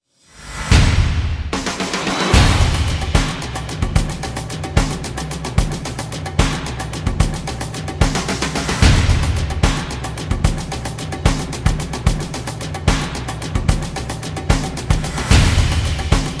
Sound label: Drums Only Version